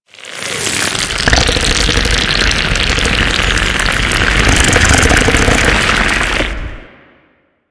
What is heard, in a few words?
Goo Gun Sack Build Up